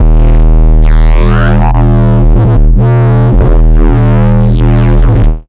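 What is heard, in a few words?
mad filter bass; Jungle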